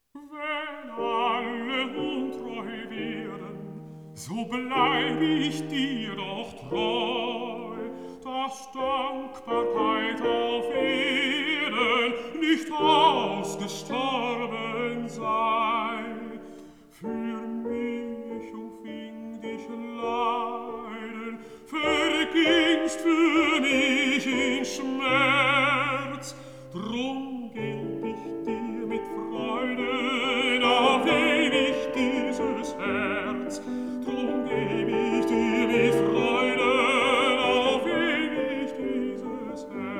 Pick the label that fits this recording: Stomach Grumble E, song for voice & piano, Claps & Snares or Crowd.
song for voice & piano